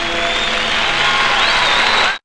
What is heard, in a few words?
CROWD